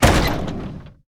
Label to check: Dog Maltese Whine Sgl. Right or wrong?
Wrong